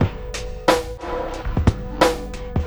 LOPE A DOP, loops, 90bpm; Demo Multitracks

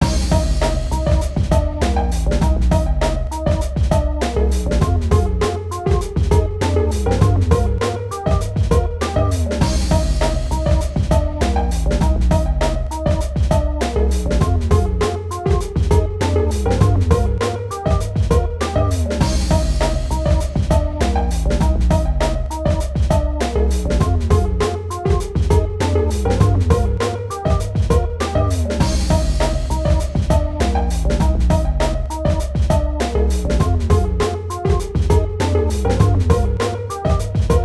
Instrumental

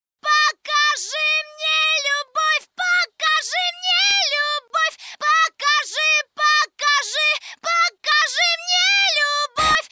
Acapella Chorus

